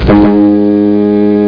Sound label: Foghorn; stepup